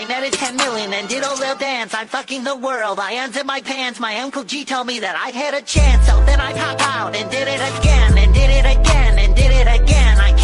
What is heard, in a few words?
rap; sponge